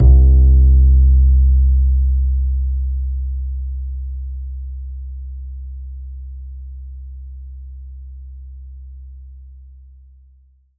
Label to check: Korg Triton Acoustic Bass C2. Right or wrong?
Right